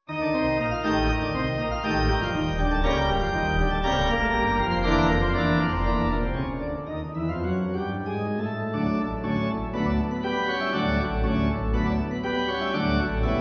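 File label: Pomp Circumstance March, Pipe; Elgar; Op39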